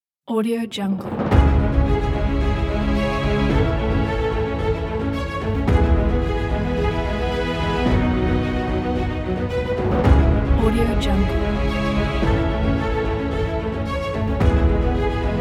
powerful and epic; sunproductions

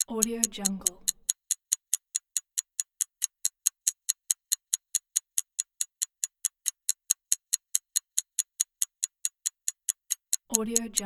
fast clocks; fx-market

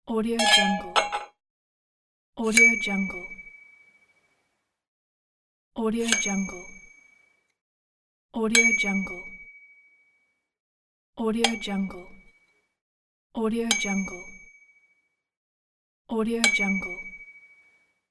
metallic ping; teasound

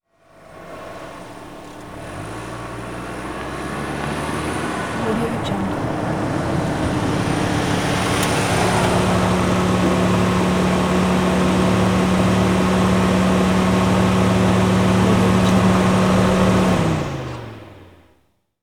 sedan chase maneuver

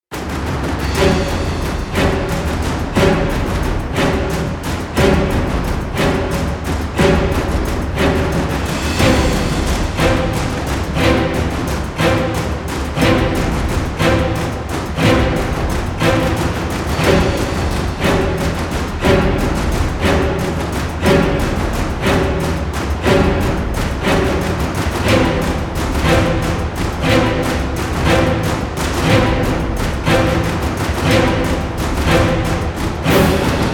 epic violins

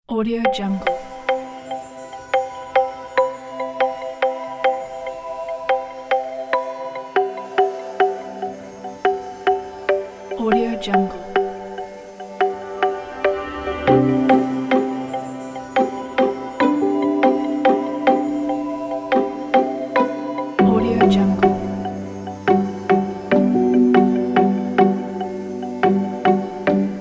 ambient documentary

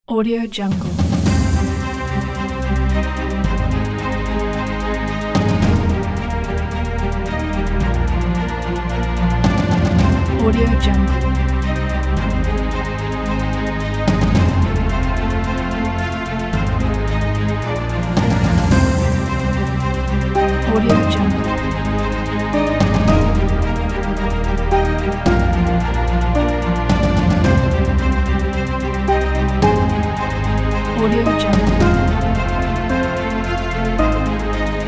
epic victory; marstereon

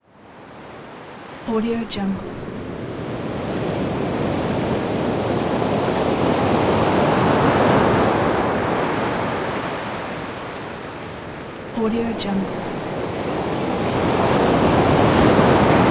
sea waves sound

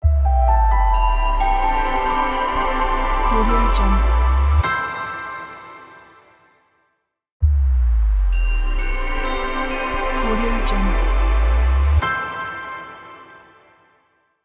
clean elegant logo reveal

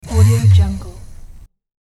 motor shorter still

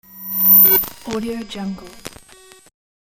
closed electric circuit

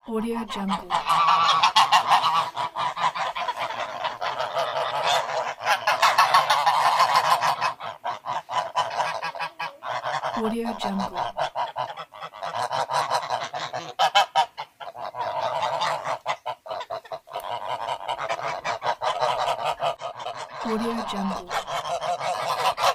geese

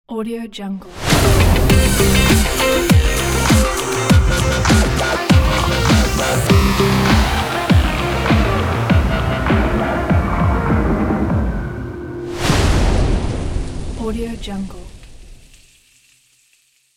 urban logo

